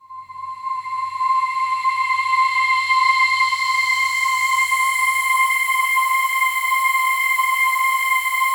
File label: PAD, DIGI-PADS